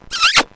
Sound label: smooch